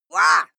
female fight shout pain

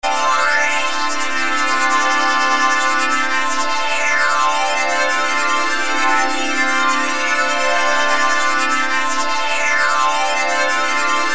hard sweep modulated

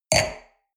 wine glass place table medium; berufe; soundpool

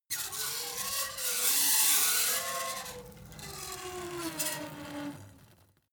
metal screech phaser edgy

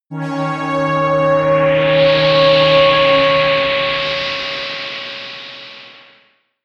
air trumpet wind high, drones

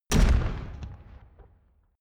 metal spike

